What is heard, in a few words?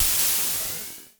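Klone Dual Percussion Synthesiser noise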